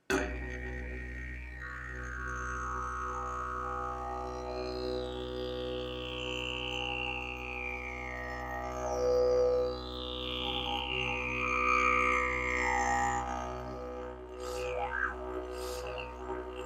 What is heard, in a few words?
big bore didges